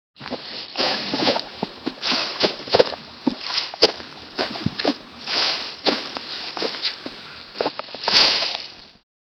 the sound of a, galloping

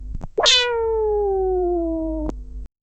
a comical cat yowl

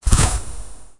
fire magic spell casted, spell

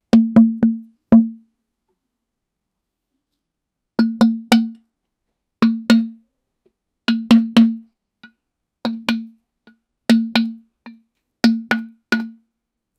ball hitting plastic bott

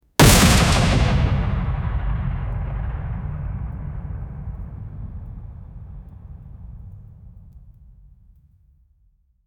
boom mega tank 150mm gun, artillery

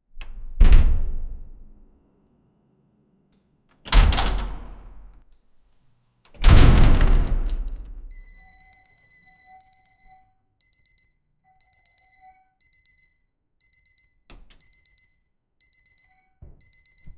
a large heavy door openin; interior

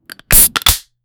beverage, sound-effects

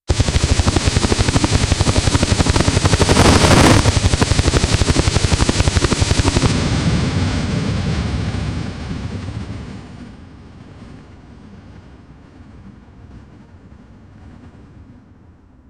continuous powerful slow, cannon

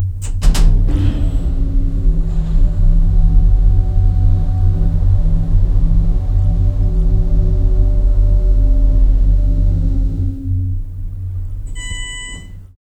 a modern elevator

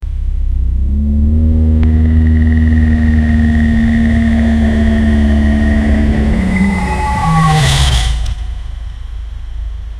cars

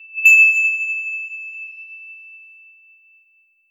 heal regenerate full sound; healing

